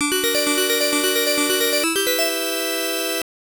Game Over Music

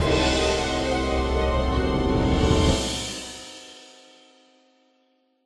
Die; tintin reporter cigars of the pharaoh

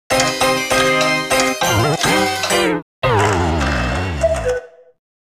Failed; Taiko no Tatsujin Doka to Oomori Nanadaime; PS2

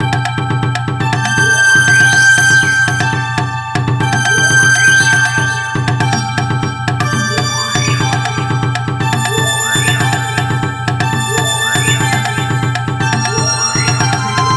BGM; Simple 2000 Series Vol. 36: The Musume Ikusei Simulation: Otousan to Issho; ps2

